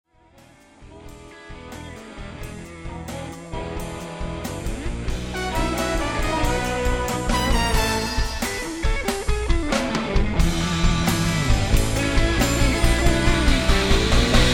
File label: Motor blues